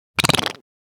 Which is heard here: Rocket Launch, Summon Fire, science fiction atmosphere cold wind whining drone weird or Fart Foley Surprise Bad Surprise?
Fart Foley Surprise Bad Surprise